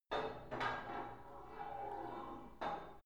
put light weight onto barbell soft reverby, fitnessstudio; sport freizeit